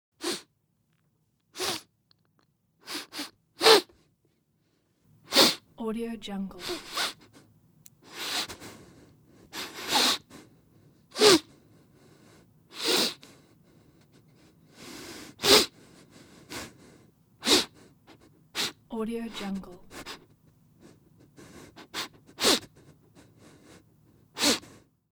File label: male crying sniffing